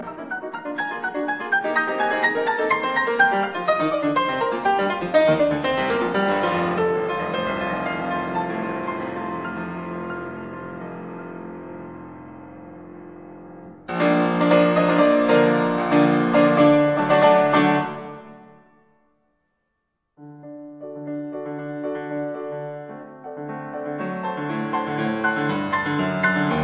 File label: Piano Sonata in B flat major Allegro; No 29; Op 106; Hammerklavier